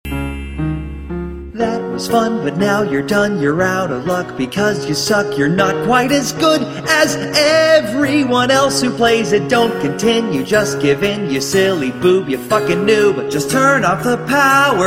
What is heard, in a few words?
Game Over